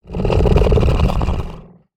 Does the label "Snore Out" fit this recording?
Yes